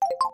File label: new message